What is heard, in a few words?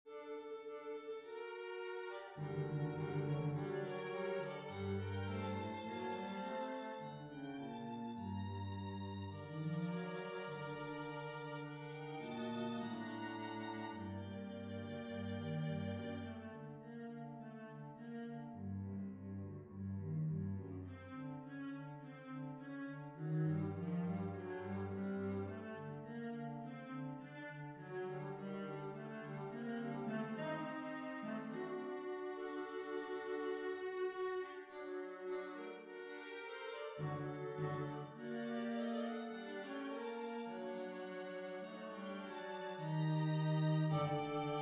String Quartet